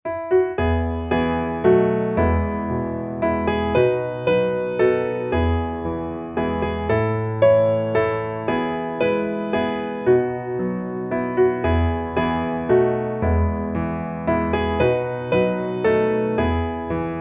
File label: piano